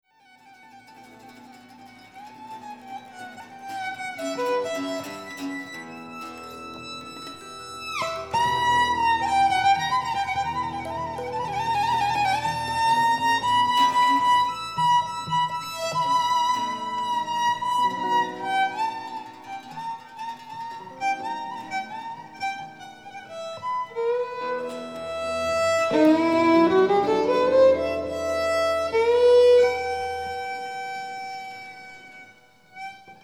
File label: instrumental